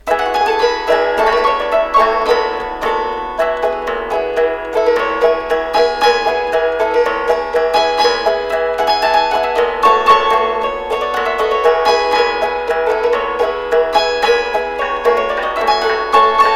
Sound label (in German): Marsch